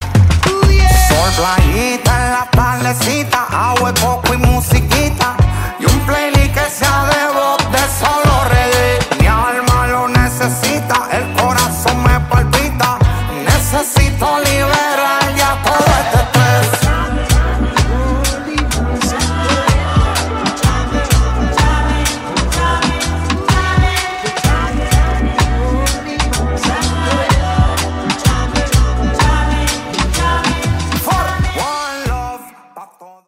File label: rasta reggae